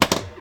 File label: Slingshot